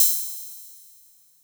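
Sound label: hi-hat open, Vermona DRM2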